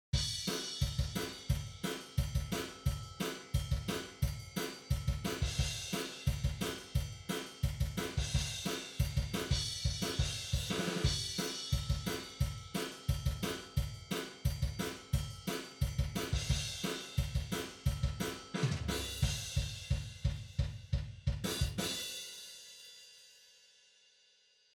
Reverb Only

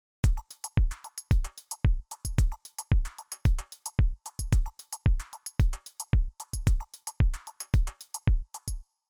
kick on mid

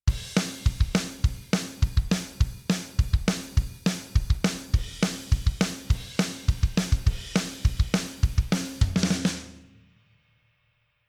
drum off

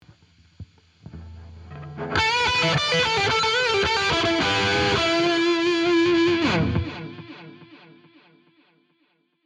guitar solo on

